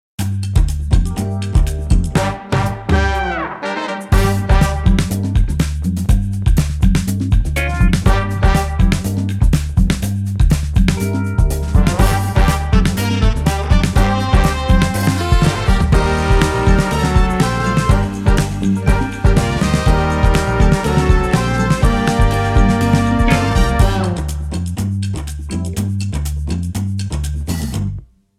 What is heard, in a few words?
Bass Groove